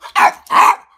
maltese dog; animals

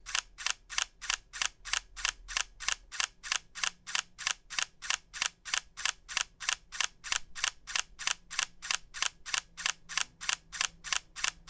camera cont shoot

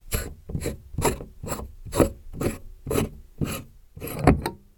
socket; electric

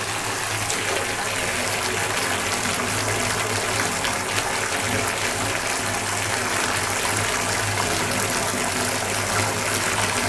water in bath, 風呂にお湯入れる; 風呂にお湯入れる３; wetarea

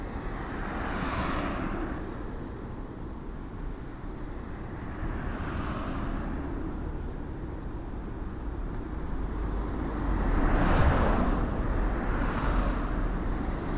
town road

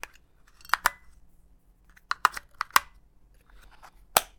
batts into clicker; electric

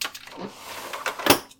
accordion screen

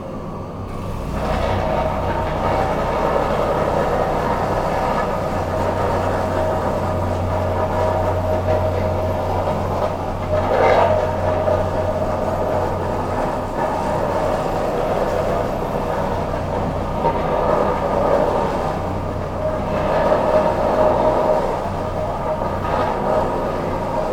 streets construction

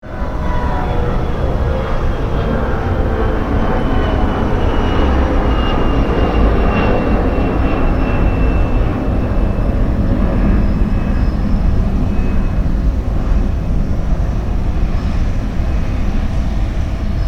airport

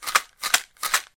shake pill

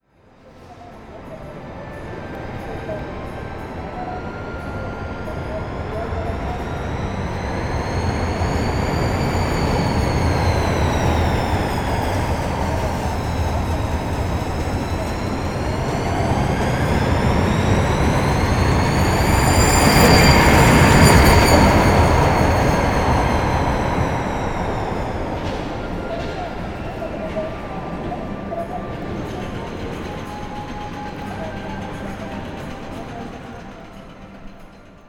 roller coaster

